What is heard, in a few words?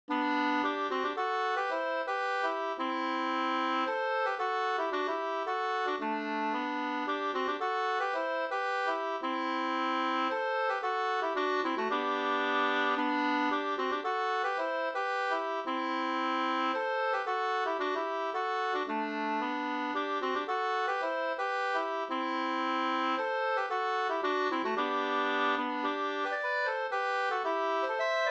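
polska G major, two-part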